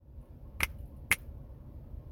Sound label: Double Snap